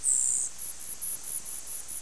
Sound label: grasshopper; de uffes